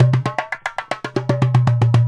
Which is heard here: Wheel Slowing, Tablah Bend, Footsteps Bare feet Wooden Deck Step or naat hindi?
Tablah Bend